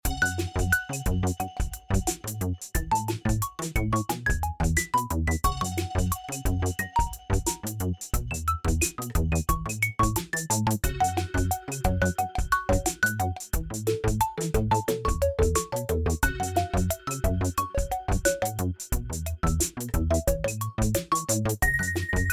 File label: robotic loop